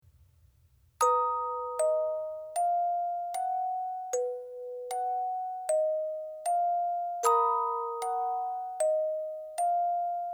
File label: Music Box Piano Clari